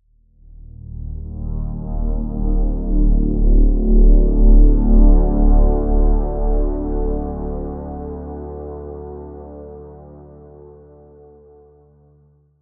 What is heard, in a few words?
Evolve Brassy Drop, brass rise; brass rise fx